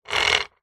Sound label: industrial chain small pull over thin wood hoist medieval very short fast